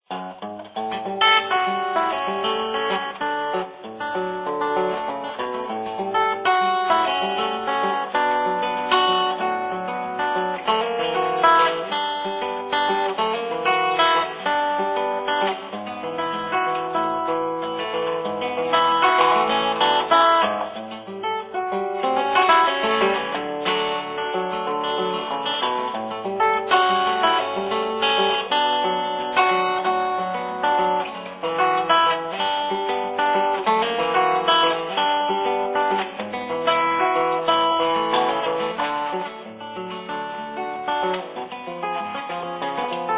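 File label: Music Ambient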